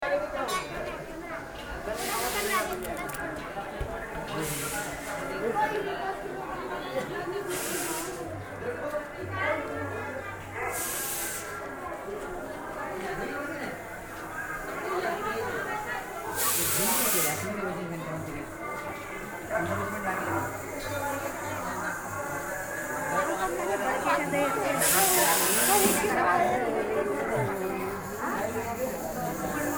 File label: Chai Wallah Ambiance; Ximm